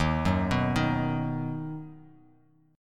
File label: Eb Minor Sharp 5th Eb down; Ebm#5 arpeggiated; JGuitar